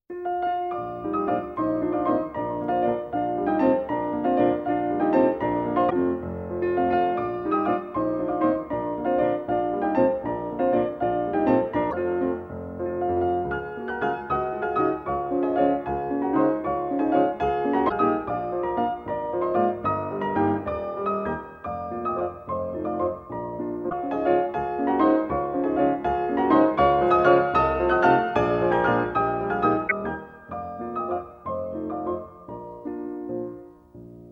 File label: Waltzes for piano four hands in Bb Major; Johannes Brahms